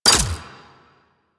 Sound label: Flak Cannon Mech; Railjack